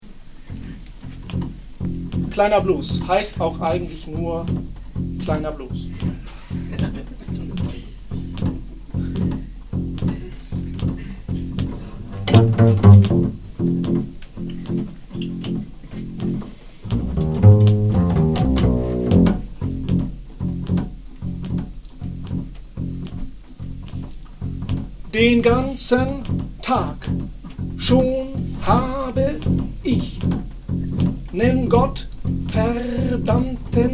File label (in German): Kleiner Blues